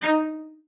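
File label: CELLO spiccato D#5; Xsample VOL-5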